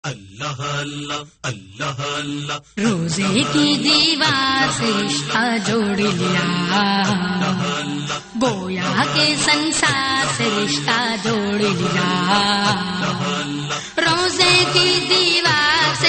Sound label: Naat